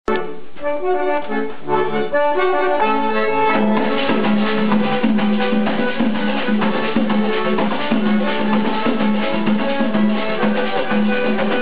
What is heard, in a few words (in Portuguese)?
acordeon e pandeiro